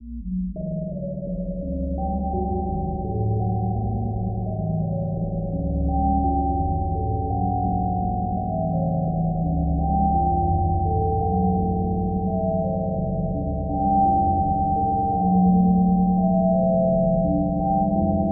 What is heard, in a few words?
Atmosphere Shooting Stars